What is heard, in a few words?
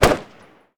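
m 16 exterior shot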